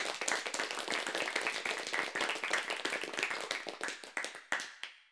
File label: Many People In Room, Clapping